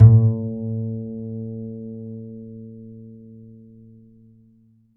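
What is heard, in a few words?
double bass A2, Jazzistic; Univers Sons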